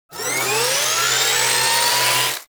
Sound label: Zap Charge; Trapper